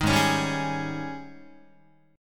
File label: G sharp Major 7th Suspended 4th, Guitar